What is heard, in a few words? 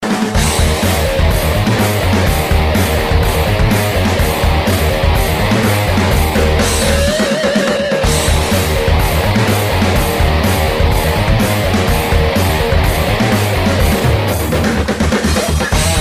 Rock